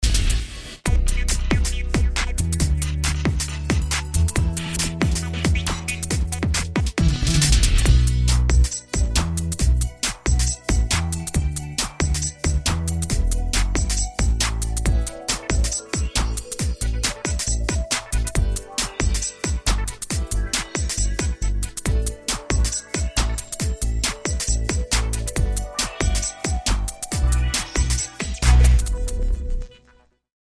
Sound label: Smoove Groove